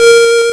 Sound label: Hard PCM Chip; chiptune